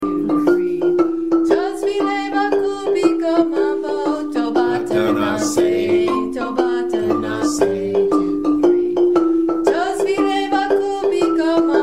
vocals line; kare mugomba

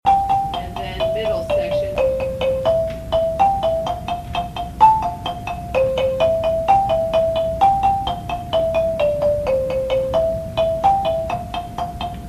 lead middle low, marimba

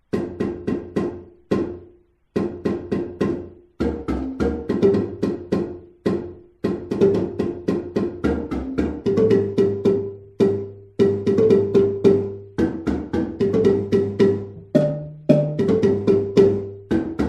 bass verse, marimba

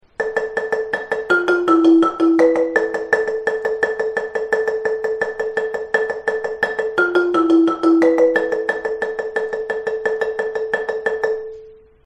pre chorus, marimba

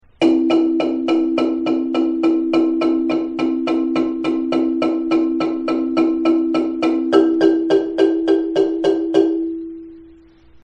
marimba, layering end

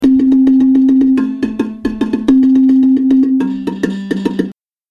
bar high, marimba

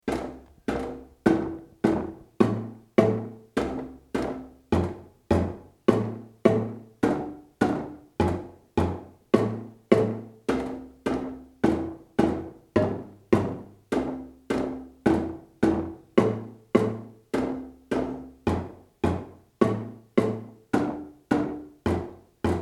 bass, marimba

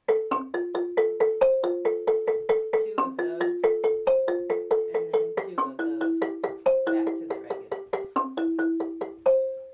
coda, marimba

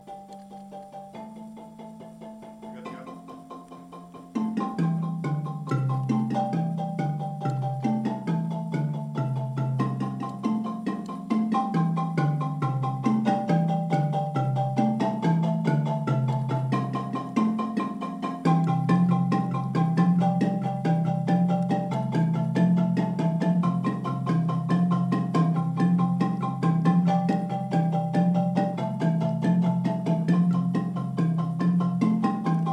bari, marimba

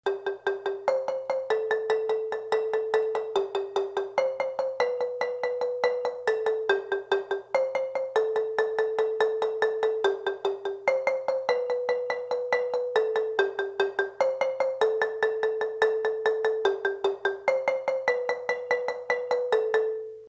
high rolling, marimba

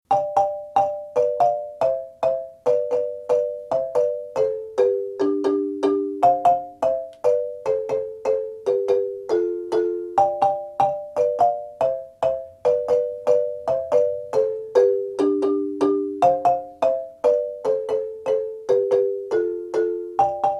lead descending chords, marimba; kare mugomba

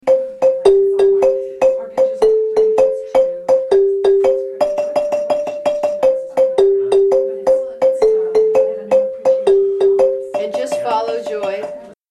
middle low, marimba

